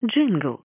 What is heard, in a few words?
spy jingle